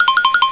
WIGGLE

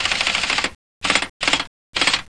JACKHAMR

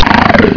EEOOI, weird

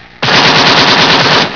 GUNFIRE

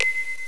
PING

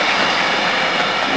AIR EXPL K

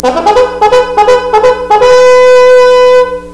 charge

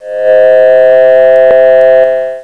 AAAUUUG

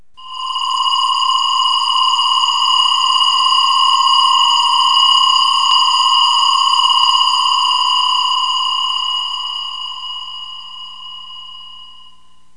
SCIFI

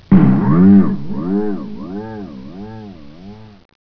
boinngg, cartoon

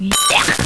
YECH

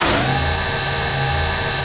REPAIR

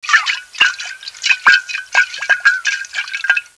SLOSH

